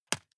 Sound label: juggle catch medium